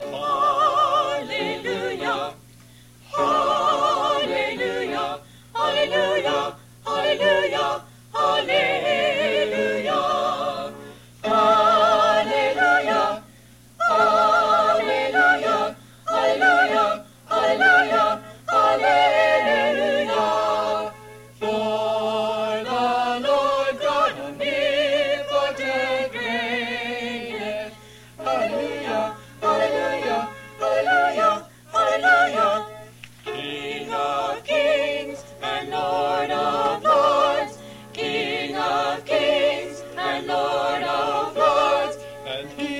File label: hallelujah chorus